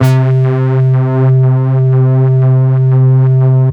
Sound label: Tech Bass C1, techno 120bpm; musicradar; Bass Multi